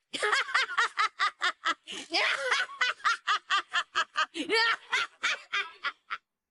laugh good; luffy

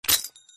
grenade pin

